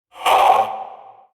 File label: Possessed Red Veil Vocal Pain; Warframe; PriestQuest